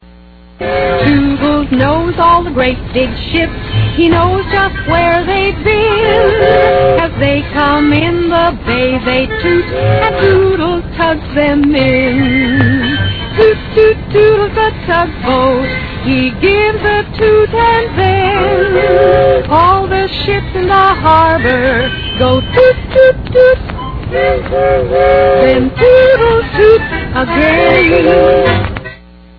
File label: tug